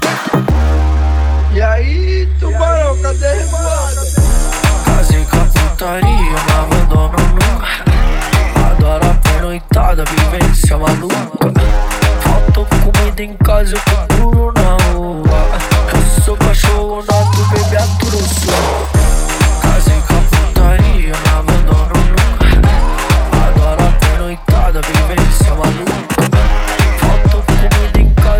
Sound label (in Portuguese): funk mega funk